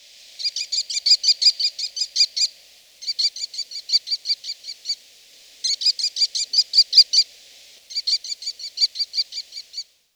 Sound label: American Kestrel